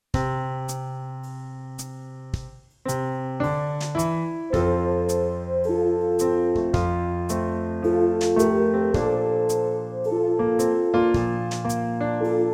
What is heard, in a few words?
semi in F, brass, practice